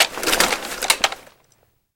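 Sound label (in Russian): быстрая резкая перезарядка гаубицы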